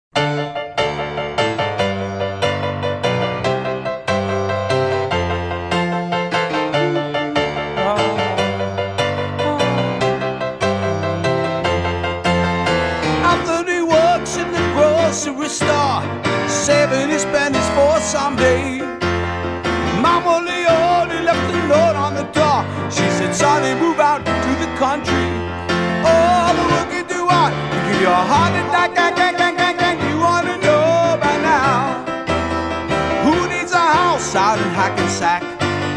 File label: Solo Piano